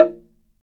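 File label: Slit Drums, hard; Roland LCDP11 Africa VOL-1; Wood & Metal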